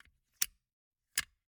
Gumball machine, candy door, plastic, open, shut, hyper; Eiravaein Works; Vaeyan IV